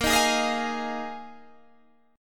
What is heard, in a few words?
A sharp 7th Suspended 4th down Guitar DADGAD